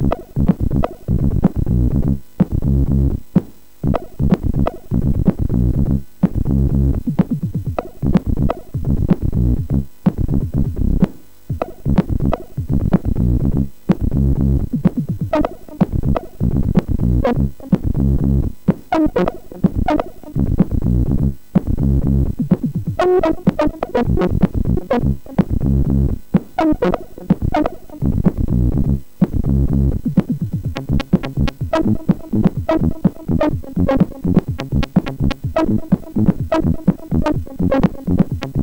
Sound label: One Channel Music, sid MOS6581R4; hvsc